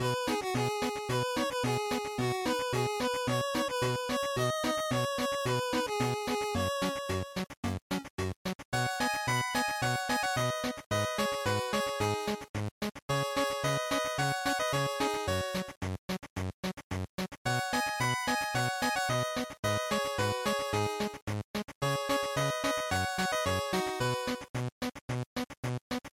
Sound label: GB General Stage Loop110